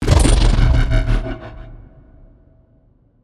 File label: BLACK MOLOCH EMP Hit